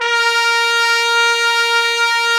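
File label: trumpets section; Roland L-CDX-03 Disk 2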